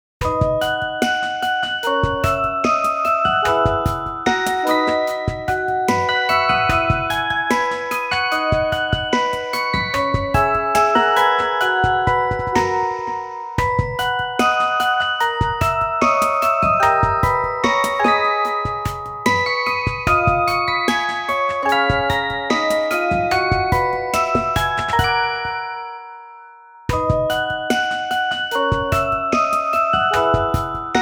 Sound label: Music Box Rhythm Version; TYPE-MOON Music Collection; Fate Tiger Colosseum; Fate-Tiger Colosseum Upper OST